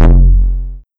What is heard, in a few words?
Kick K78